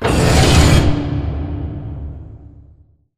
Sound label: sliding door lg verb open slide; beacon; Titanfall 2